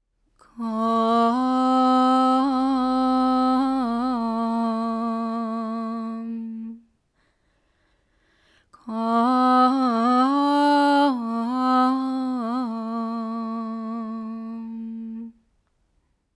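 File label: female vocal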